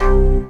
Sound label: Dance Mega Synth; Best Service; 90 s Sample CDs